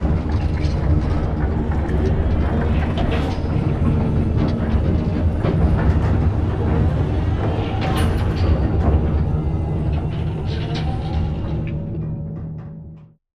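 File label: dog whistle idle mech medium distance; boneyard; Titanfall 2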